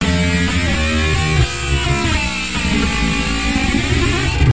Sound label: flanger